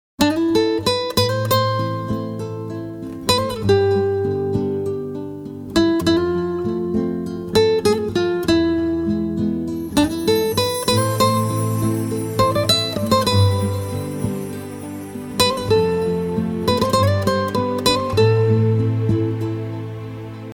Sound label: persian ringtone, guitar